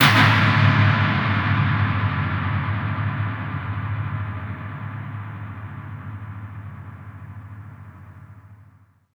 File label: HITS, Special FX Twisted; USB Soundscan; AKAI